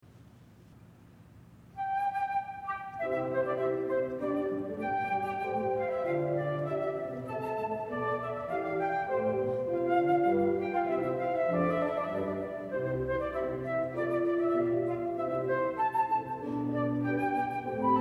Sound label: Sonata in C for Flute; Marcello